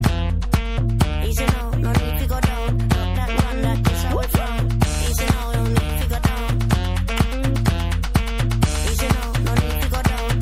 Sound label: columbia ringtone